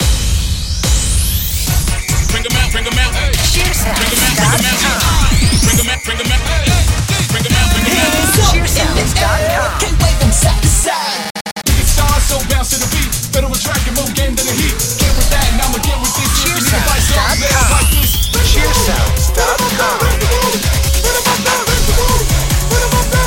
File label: hip-hop theme